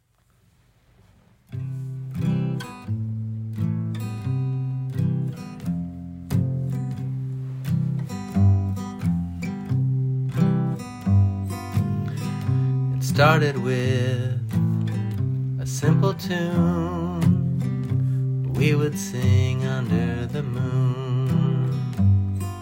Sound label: simple tune